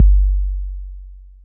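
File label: 808 bd, bass drum, drum machines; 808 Extended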